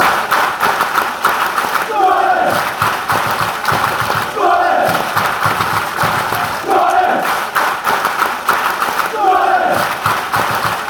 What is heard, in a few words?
clap, fanchants; geordies